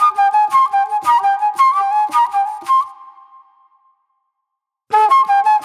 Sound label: Flute Noir; Sonic Foundry (Sony Creative Software); Manhattan Flutes